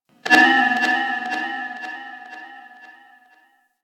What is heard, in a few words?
Ship Energy Bounce; Ambience; Warframe; Corpus Ship Remaster